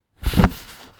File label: cloth; バサッ（ドサッ？）